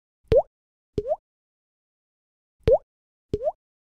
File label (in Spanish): gotas de agua